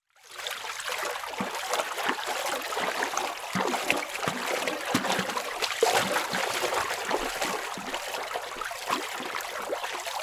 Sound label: Liquid Water Water Hands Circles In Water